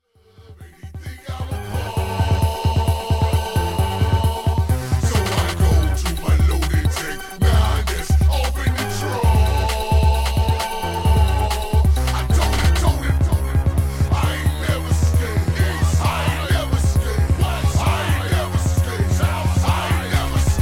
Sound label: bass fears